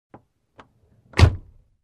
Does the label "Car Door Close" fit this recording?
Yes